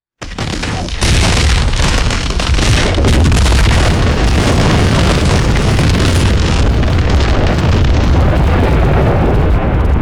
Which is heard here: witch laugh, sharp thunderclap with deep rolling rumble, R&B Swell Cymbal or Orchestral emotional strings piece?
sharp thunderclap with deep rolling rumble